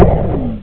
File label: Shot; Beyond HyperCard